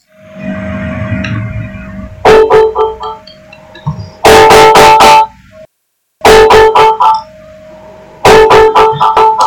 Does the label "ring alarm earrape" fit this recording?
Yes